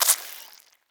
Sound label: spider bite suck